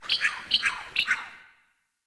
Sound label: Cardinal Decoy Call; Objects; Thawtide